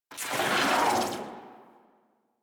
shock rifle tail; Warframe; Weapons; Corpus

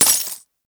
Fist Claw Coin Hit; Warframe; Tenno; Incarnon

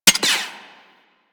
Sniper Mech; Warframe; Tenno

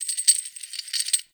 Under City Chain Creak

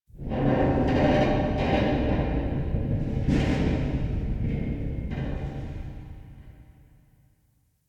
Stalker Cave Metal Tension; Ambience; Warframe

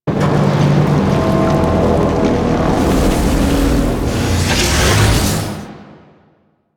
Void Dragon Pillars Pre Launch; Warframe; Duviri

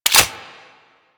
Grenade launcher Mech; Warframe; Weapons; Corpus

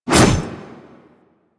Wraith Reaper Melee Whoosh; Warframe

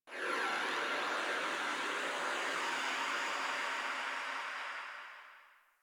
Landscape Wind Atmosphere Anomaly, Ambience

